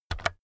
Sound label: keyboard external key press fast